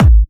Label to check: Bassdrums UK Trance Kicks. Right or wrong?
Right